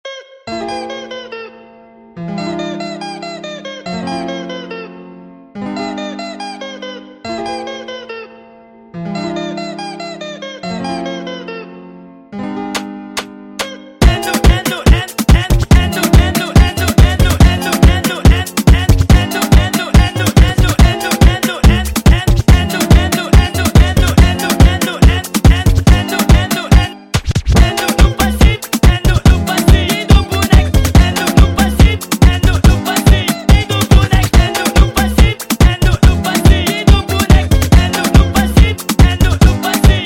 Funk super Sped Up

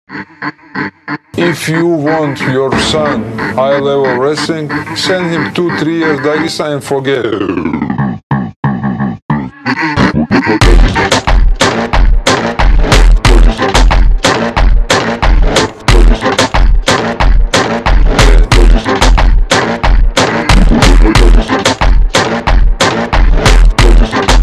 Funk slowed

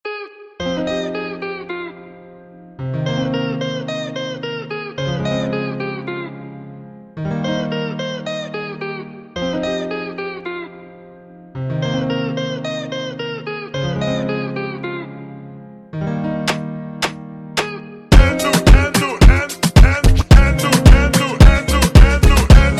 Funk extreme Slowed

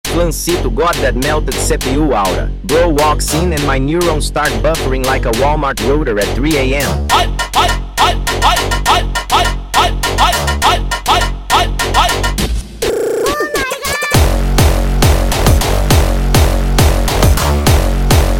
Hardstyle sped Up

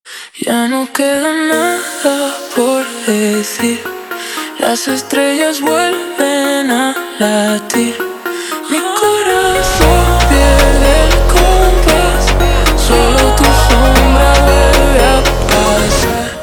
Funk slowed

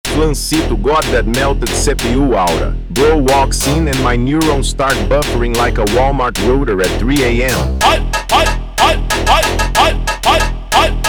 Hardstyle slowed

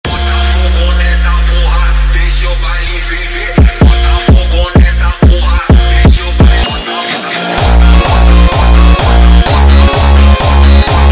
Funk sped Up

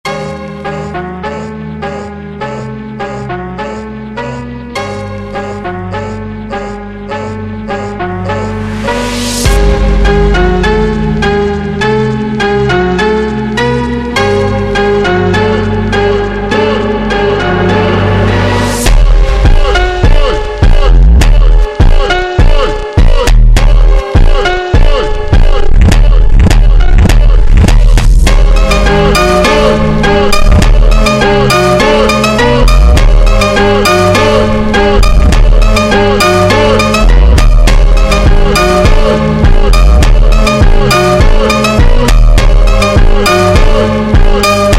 Funk super Slowed

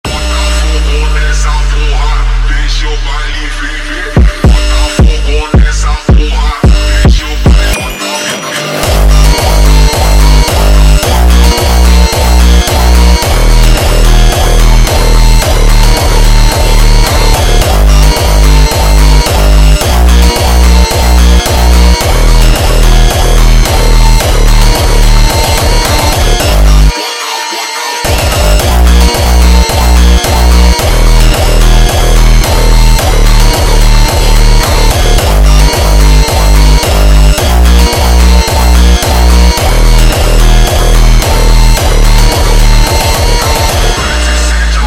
Funk slowed

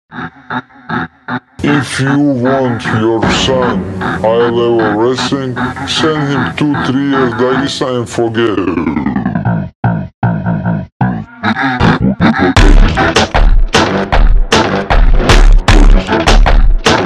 Funk ultra Slowed

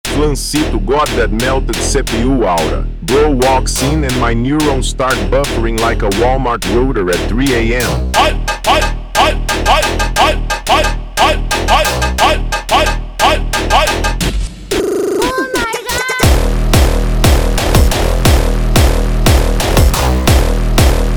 Hardstyle super Slowed

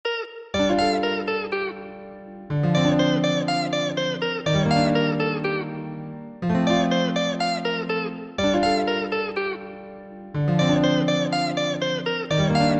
Funk slowed

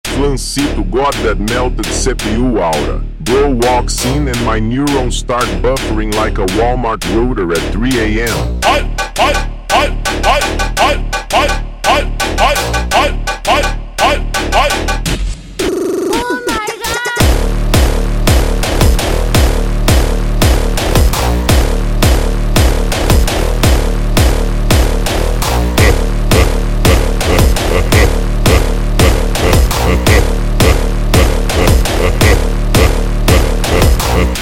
Hardstyle extreme Slowed

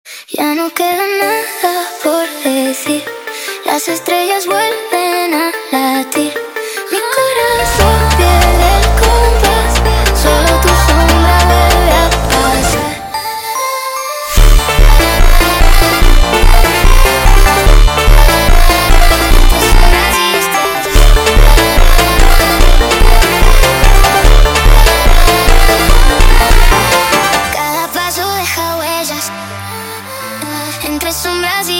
Funk sped Up

